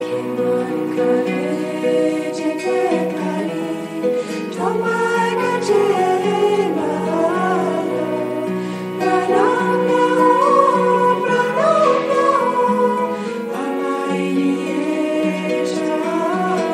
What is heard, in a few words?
bengali song